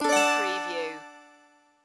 Casino Slot Machine Strum Twinkle

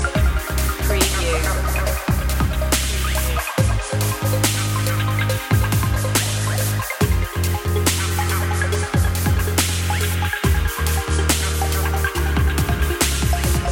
emotional dubstep loop

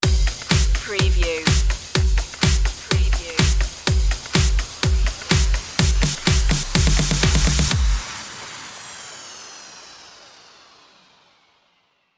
pop till we drop stinger

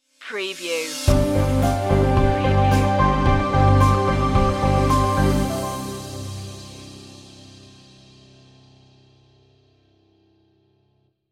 look up stinger

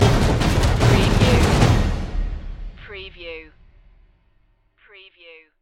epic percussion stinger

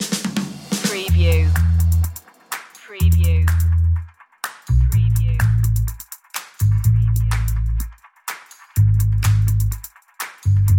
positive flow drums and bass

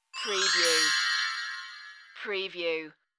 Bells Chinese Bell Tree

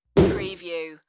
Hit Snare

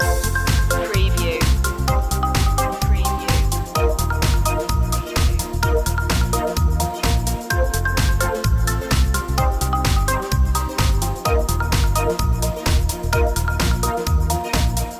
up to this beat loop

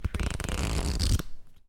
Playing Cards Fan Flip

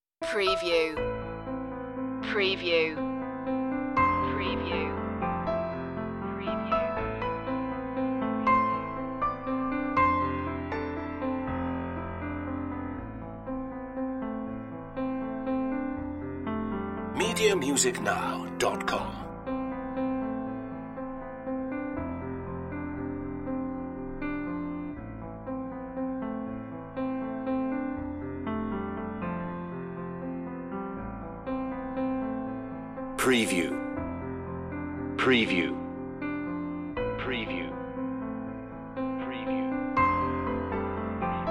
piano only version

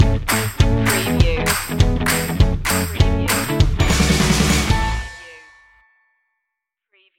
cheerful indie rock stinger

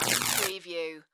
Electrical Arc Zap Short

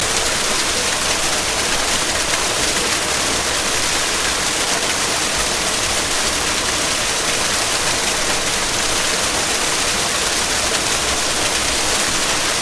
Waterfall Fast Splattery Loop

